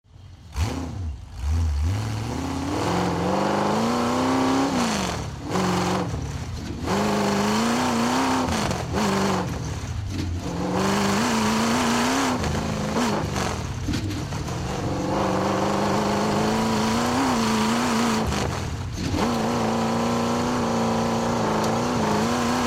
Chevy V8 Hot Rod Long Sound Engine Fast Driving Around; Recording from driving around onboard a Chevy Hot Rod. Lots of acceleration, slowing down, engine re; Car Sound Effects; Lynne Music